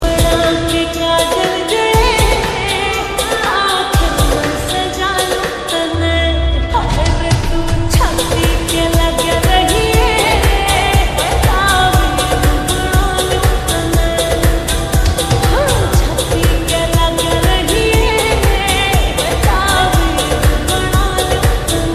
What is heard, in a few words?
Lofe Song